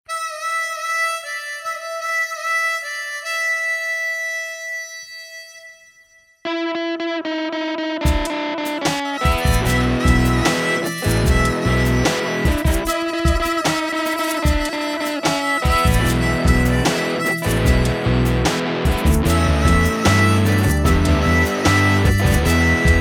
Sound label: Westernblues